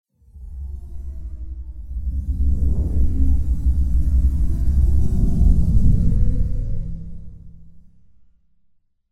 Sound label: Horror